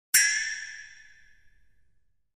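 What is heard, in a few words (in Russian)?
удар по металлу звон металла комично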